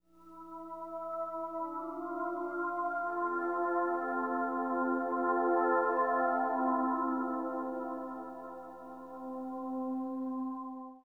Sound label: PAD, Hip Hop and R&B; Best Service ProSamples vol.02; AKAI